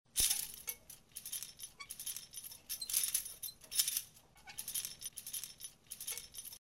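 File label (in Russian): звук раскачивающейся люстры; для создания атмосферы ужаса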